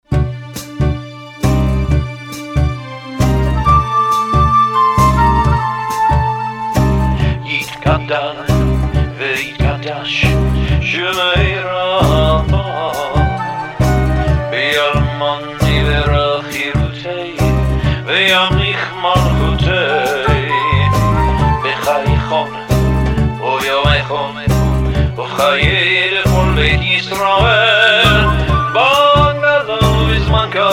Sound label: chatzi kaddish; Rimonim